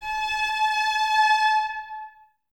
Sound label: String Ensembles, Violas